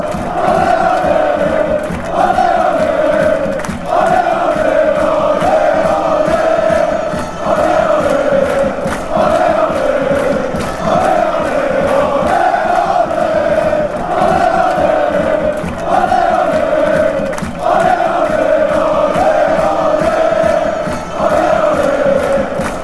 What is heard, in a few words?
slovenia chant